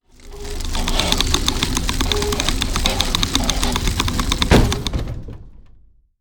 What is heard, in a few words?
Medieval castle drawbridge lowering